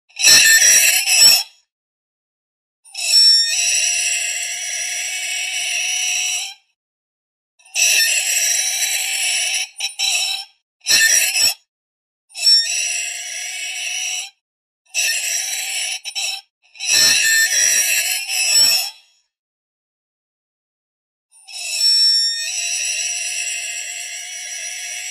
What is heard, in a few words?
Glass Scratch